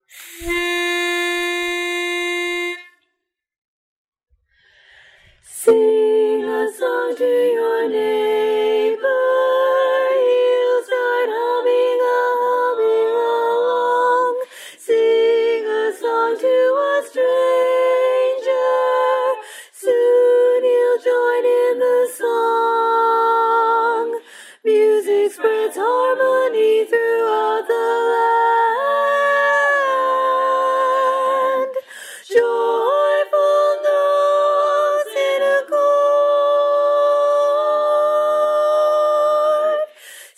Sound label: Harmonize Tenor; Harmonize the World